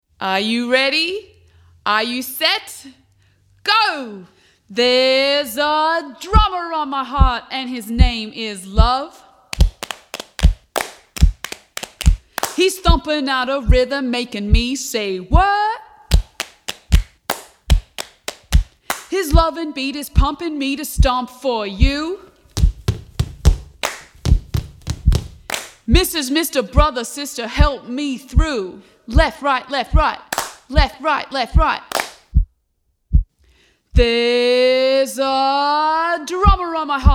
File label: Drummer Song